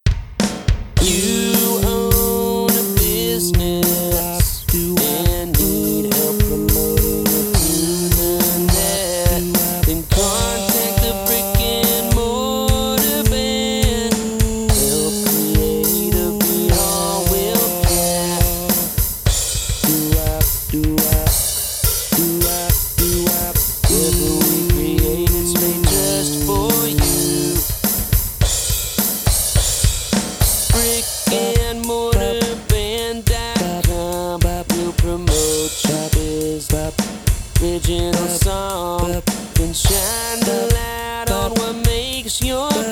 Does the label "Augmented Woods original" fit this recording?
No